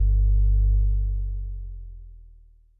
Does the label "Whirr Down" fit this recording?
Yes